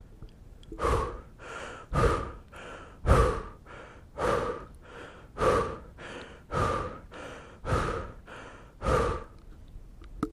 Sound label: heavy breathing